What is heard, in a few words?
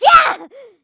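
Vox Attack, Korean; Hilda; kingsraid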